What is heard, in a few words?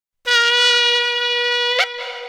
shofars